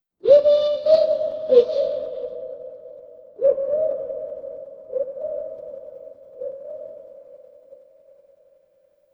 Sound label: horror ambience dark